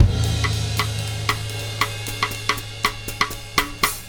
JAZZ TRAP KIT

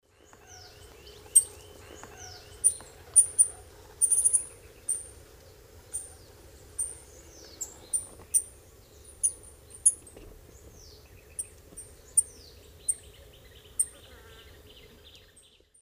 Tangara mexicana, calls and subsong; S. America; Eastern Lowlands

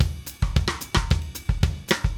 Drums, Jazz

